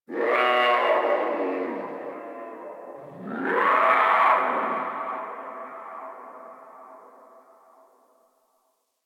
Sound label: Distant Zone Three Creature; Warframe; Ambience; Eidolon Plains; One Offs